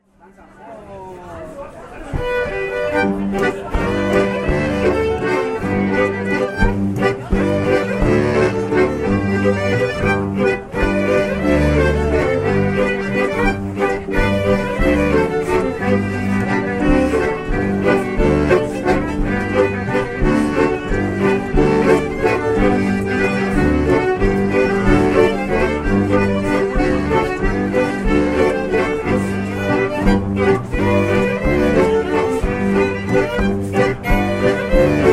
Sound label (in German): Zwiefacher; Regen 2010